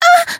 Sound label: Voice damaged; hoozuki